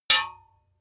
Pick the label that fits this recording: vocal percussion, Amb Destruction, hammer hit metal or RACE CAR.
hammer hit metal